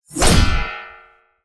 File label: block, sfx; asciinevrdie